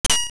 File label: Sword Tap Bomb Wall; A sword tapping a bombable wall